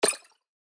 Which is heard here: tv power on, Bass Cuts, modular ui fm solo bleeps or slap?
tv power on